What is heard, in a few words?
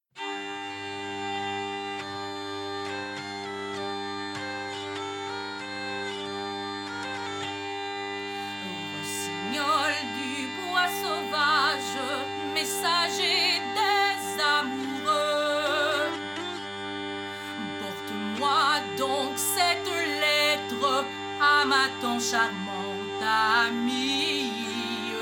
Tavern; Assassin's Creed Unity